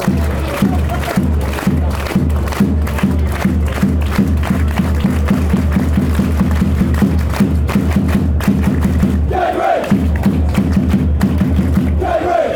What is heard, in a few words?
drums, fanchants; cambridge